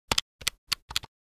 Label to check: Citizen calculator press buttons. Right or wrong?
Right